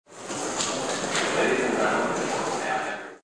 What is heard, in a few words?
Bus Station; CityBackgrounds